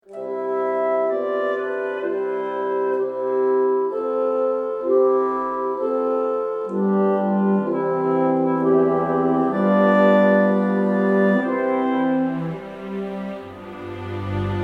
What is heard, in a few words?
winds artifical legato